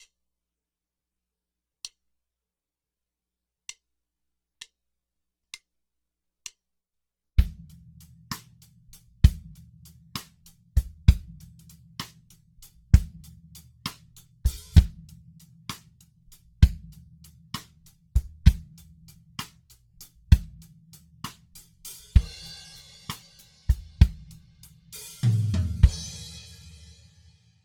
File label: Panning Test Drums